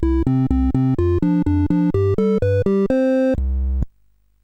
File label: Croaky; CSG8580R5